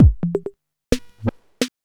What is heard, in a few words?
rollercoaster bpm130, drumloops